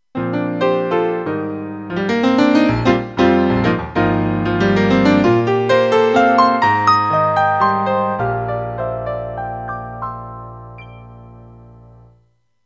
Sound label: Piano